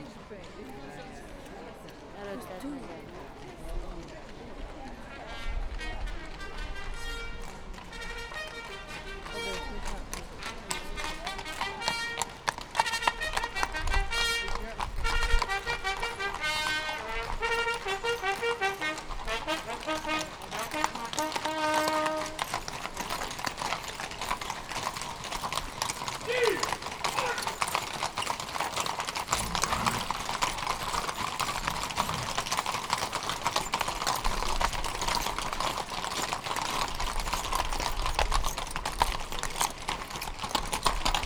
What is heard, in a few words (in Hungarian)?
kiralyi palota zenes orsegvaltas lovaskatonak